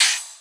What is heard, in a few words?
DRUMS Hat